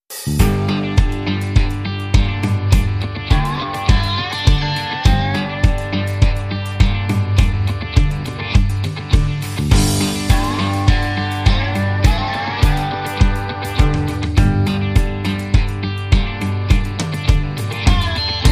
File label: Country Blues on Fire